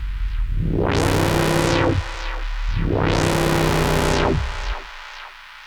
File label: Monotron Sci-Fi